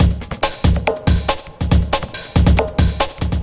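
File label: industrial 140 kit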